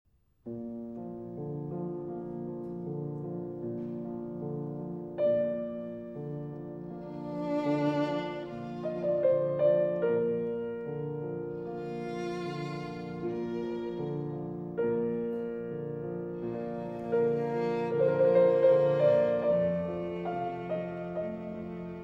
Sonata in F Major for Violin and Piano

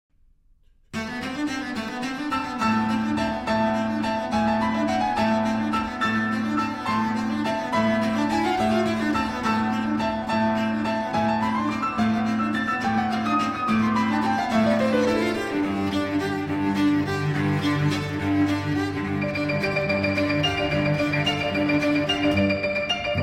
Sonata For Cello and Piano Allegro

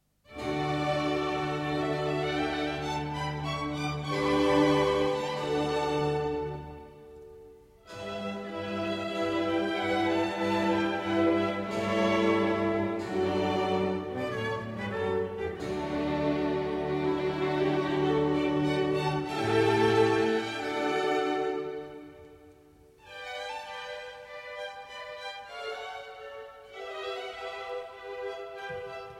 Trumpet Concerto in D Major, Adagio; Mozart, L.

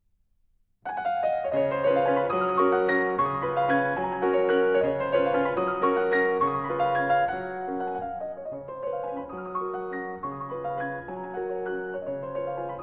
Waltzes, Molto Vivace in G-Flat Major; Op. 70: No. 1

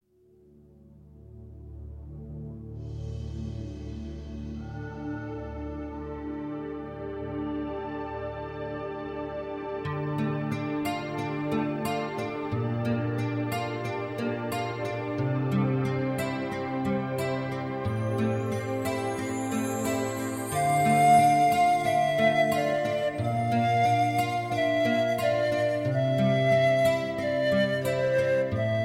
Flute Solo

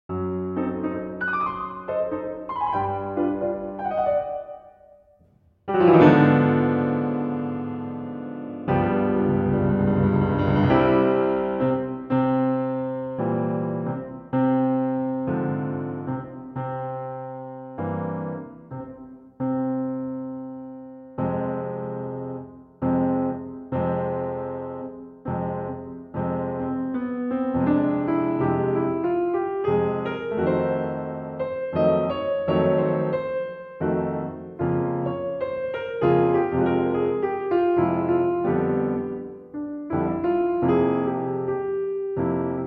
Solo Piano; Carmen; Act 3 Selections (Part II)

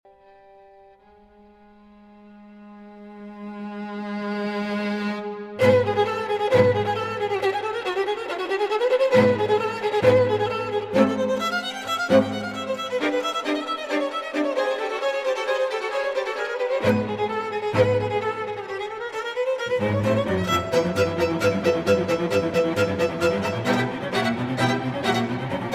String Quartet No. 8 in C Minor, 2. Allegro Molto

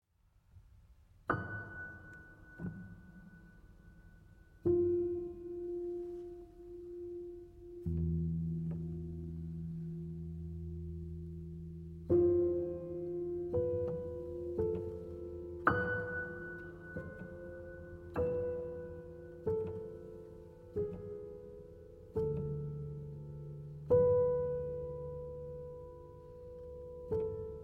A Voice in the Distance (Upright Piano)

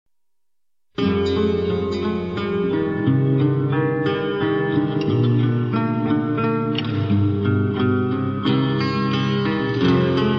Folk Aesthetic Version

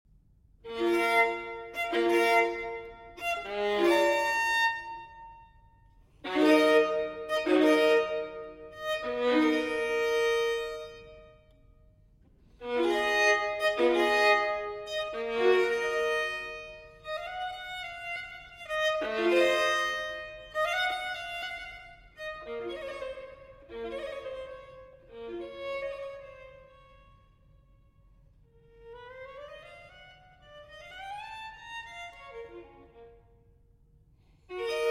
Solo Violin; Fantasia No. 4; in D Major, TWV 4017; II. Grave; Telemann

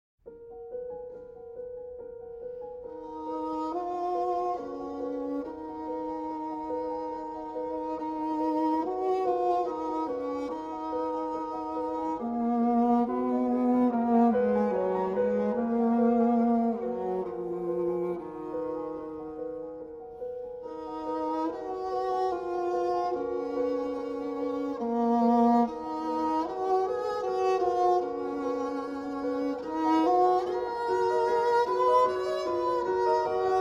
Three Pieces For Viola and Piano, Moderato; Boulanger

